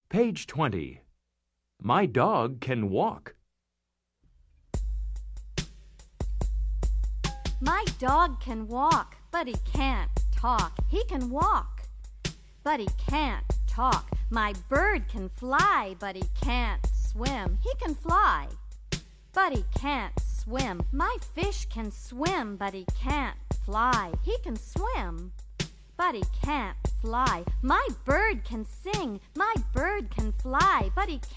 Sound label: jazz chant my dog can walk